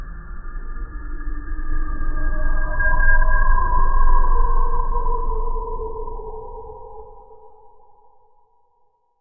slow smooth sound of an; appearance

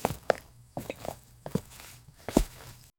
walking in boots quick

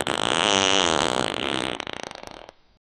sound of a floorboard cre; human emotions

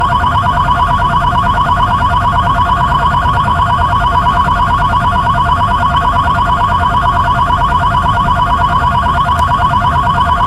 b box car engine humor

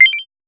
game sound effect, achievement

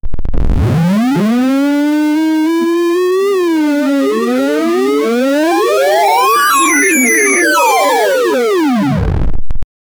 lonely bloops of water ra

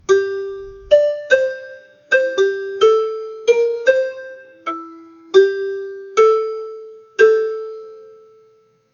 gentle and warm instrumen, asian

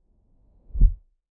fast low whoosh, air

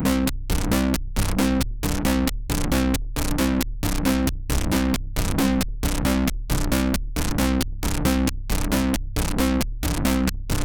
minimalistic tension bass

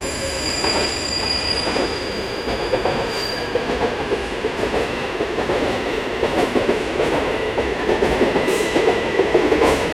trains without disruption, railway

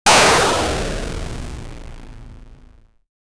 a single rapid energy, pixel

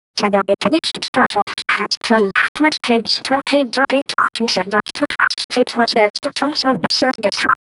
create a sound that is, corridor

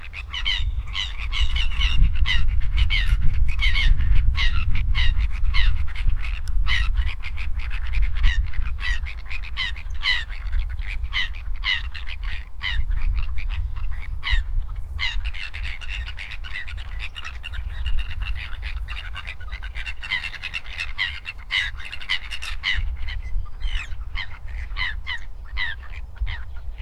natural sound near a pond, duck

